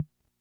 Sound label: Tom, rhythm330; classic machines